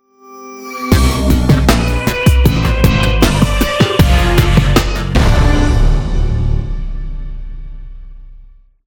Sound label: Gameover; Shooting Love 200X Xbox One gamerip 2009